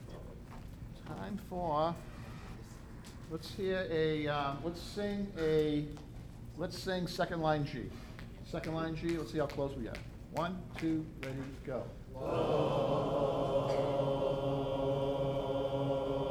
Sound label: Warm Up Singing, brass practice